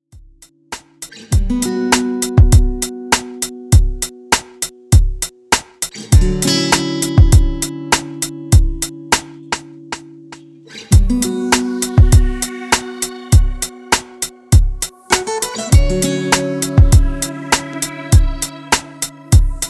Cabana Beach 100bpm remix

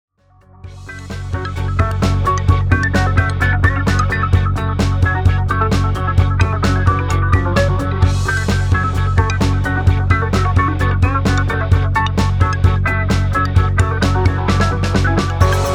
130bpm remix

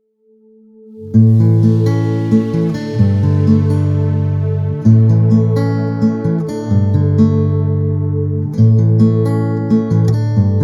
130bpm remix

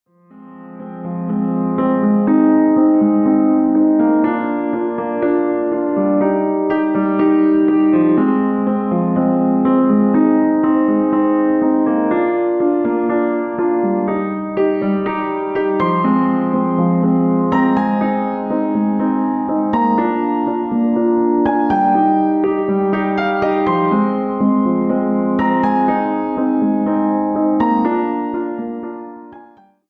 French Cafe 122bpm

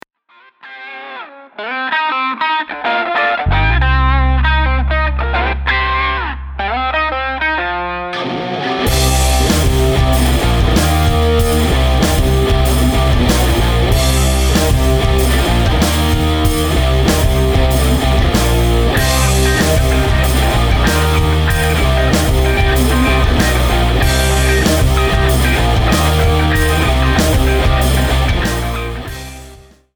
95bpm remix

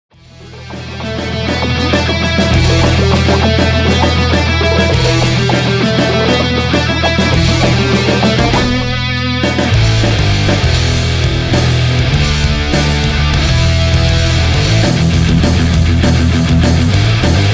We Are Free 200bpm

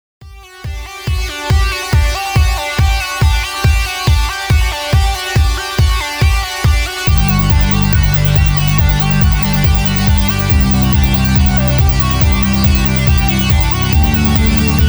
Aftershow 140bpm

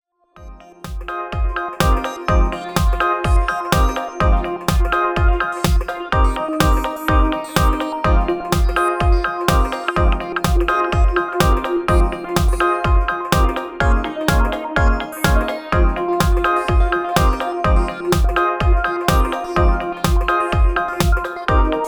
125bpm remix

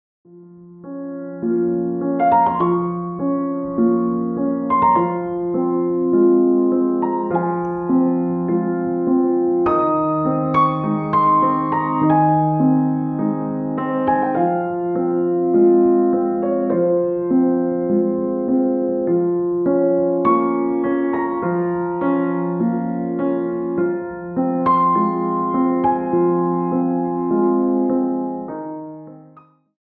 51bpm

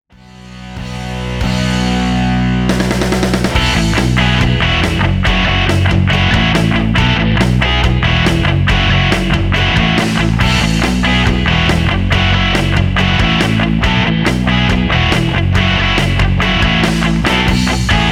140bpm remix

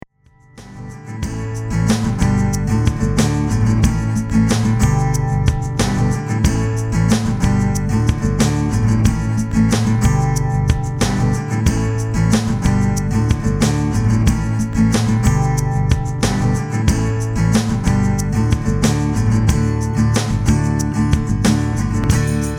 92bpm

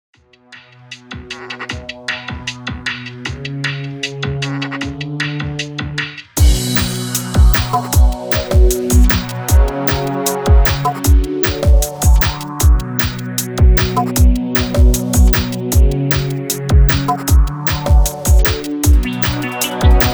154bpm remix

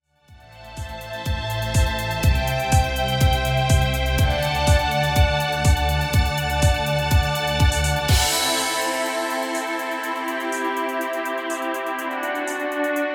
Passion 123bpm

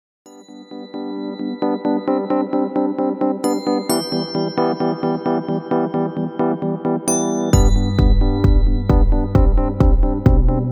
132bpm remix

